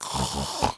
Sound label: Snort; Neighbours From Hell Console Edition; GC, Xbox